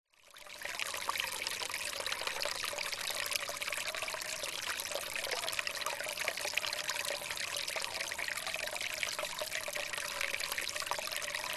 nature waterfall cascading small trickle water rocks; zapsplat